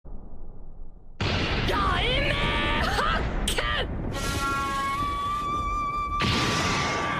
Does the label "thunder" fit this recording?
Yes